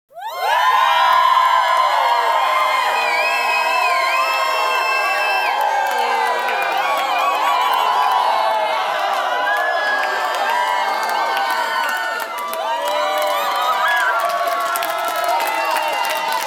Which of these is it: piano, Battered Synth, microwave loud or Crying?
Crying